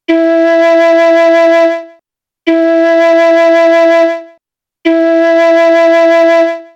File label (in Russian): 1 oktava 3 mi flejta